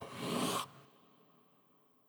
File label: slide from empty screen